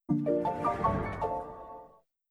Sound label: Samsung Galaxy S25 Startup